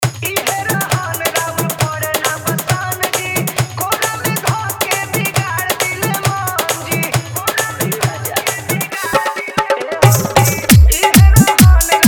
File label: New Viral Bhojpuri Dj Song Hard Dhollki Bass Mix